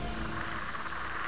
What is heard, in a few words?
click